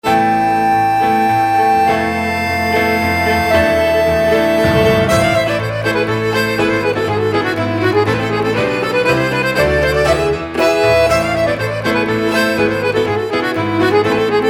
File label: polska accelerando; koiviston polska toinen